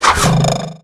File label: Pirate Ship Catapult Fling; Zelda